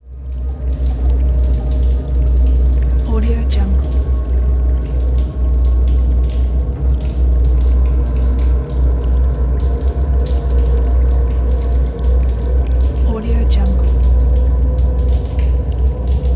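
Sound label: ambience spaceship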